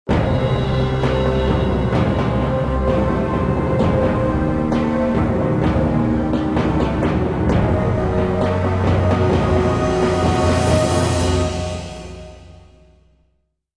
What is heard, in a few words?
Industrial Agitated; Civilization Revolution